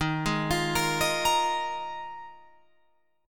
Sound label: D sharp Suspended 2nd Suspended 4th D sharp Guitar Open F; D#sus2sus4; arpeggiated; 15,15,17,17,18,17